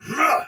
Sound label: Pain Soldier Sword Agent Male, Battle; Dialog; Barks; Melee; Sinecure